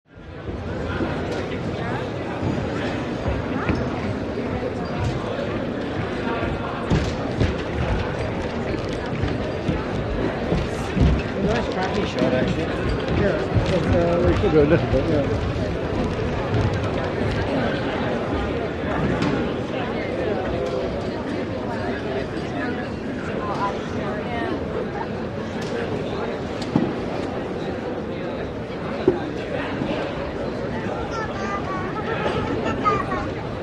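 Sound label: Crowd Large Int Buzz Busy, murmurs; Large Interior Busy Crowd Buzz.; murmurs various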